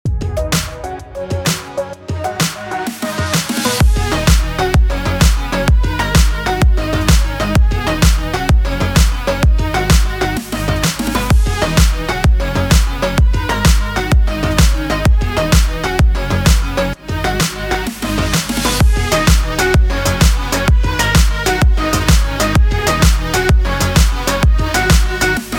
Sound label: BED ARP HOUSE EMIN 128BPM